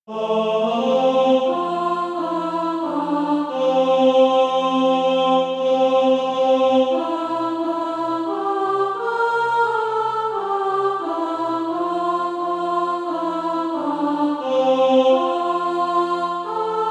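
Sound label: alto, Practise Music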